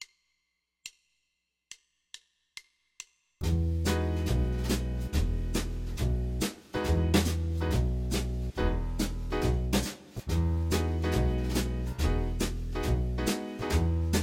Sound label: Emaj7 1 Chord Groove; guitar; guitar lessons